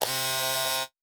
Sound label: servo small, sci-fi sounds, mechanical